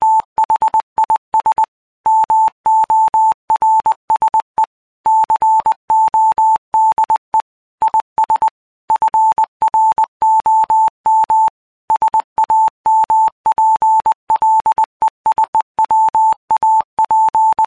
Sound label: morse code; Bleeps Blips Blonks Blarts and Zaps